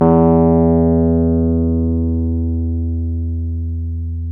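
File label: piano, Wurly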